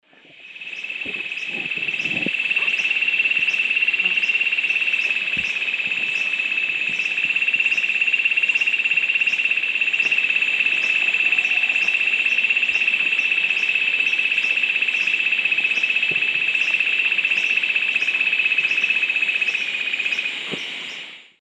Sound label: Ancistrops strigilatus, song; S. America; Eastern Lowlands